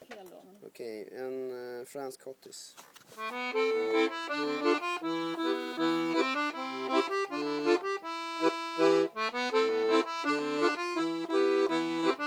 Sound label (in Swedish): fransk schottis; Kerstin